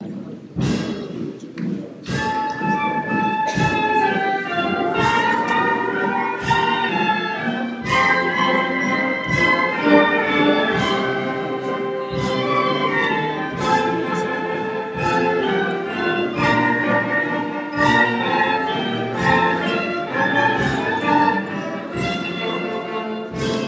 police band played

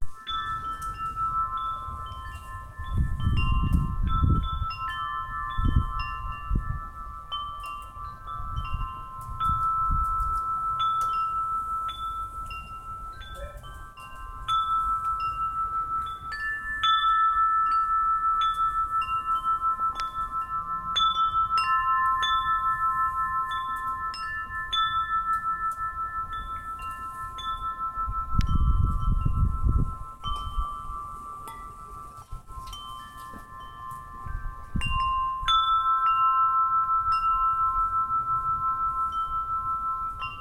chimes

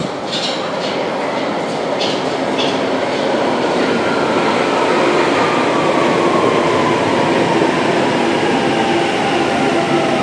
tube ride from Oxford Circus; soundmap

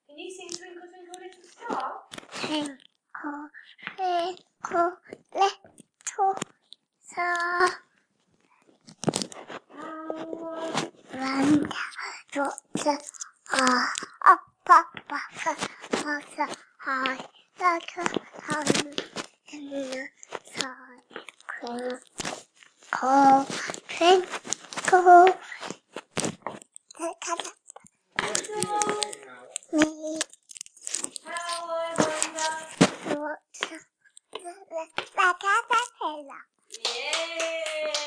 singing Twinkle Twinkle

